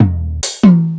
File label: Sakata Dpm481